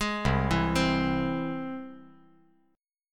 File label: C Augmented down